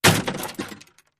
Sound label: Bullet Impacts Metal